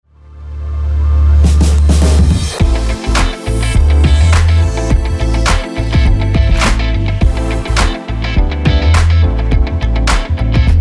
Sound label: Bass Line